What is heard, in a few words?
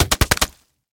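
quiet machine gun shots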